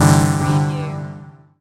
Amb chord

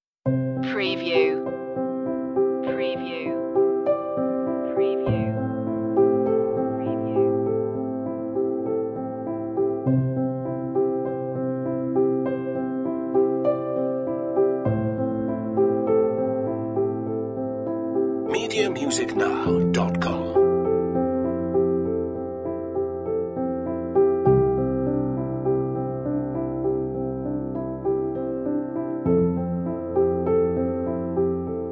no strings

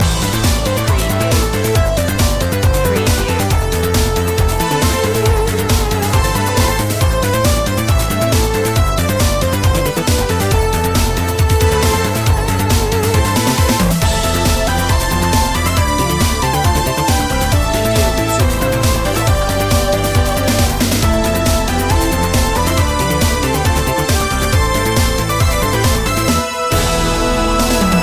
retro techno assault loop

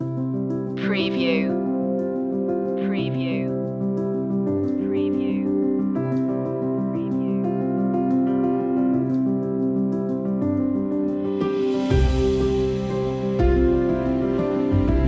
bright future ahead no vox no strings

